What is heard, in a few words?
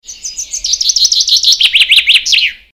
Chaffinch